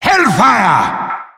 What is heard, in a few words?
staff magic